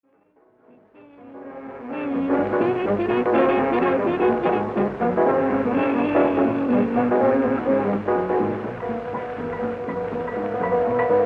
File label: trills block chords